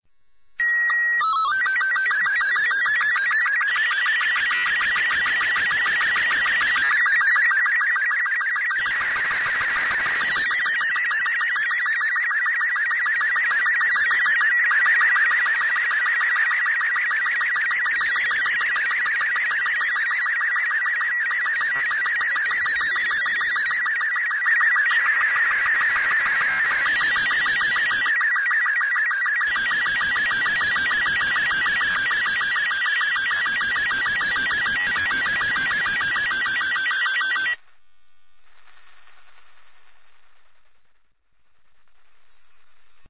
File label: ambient; Portal 2; Source Engine; video games